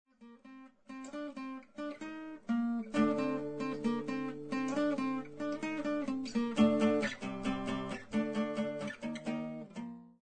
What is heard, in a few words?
Acoustic; PRC1 ReelMojo